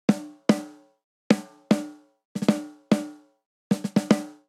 Swing Waltz Snare Only 3/4 150 bpm; MIDI Clips; Ableton; Factory Packs; Drum Booth

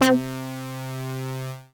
Synth Lead; Poli; Cloud Cruise

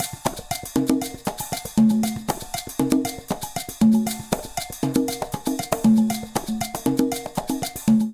Descarga 118bpm, Latin Percussion; Ableton; Factory Packs

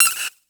LoFi sodapop; Loafa; Ableton Foundation FreePacks; Wicked Kits

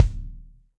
Kick Felt Low Old School; Drums; Drum Hits; Standard Hits; Ableton; Session Drums Studio

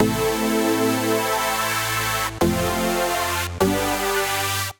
Brass (Am) 100bpm; Music Loops; Tymez Brass; Ableton; Factory Packs; Singularities

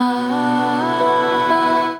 Shimmer Rack Root B, Complex Vocal Racks; Instruments; Ableton; Factory Packs; Voice Box

